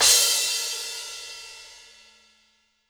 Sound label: Crash; osu